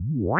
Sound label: inchworm locomotion medium slow